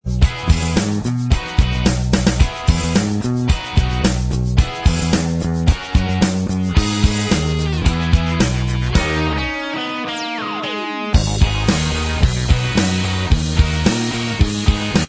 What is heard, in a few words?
Inst Track